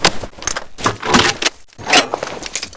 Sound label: reload R P G; sas zombie assault 4 2017 gamerip pc android ios macos; SFX reload R P G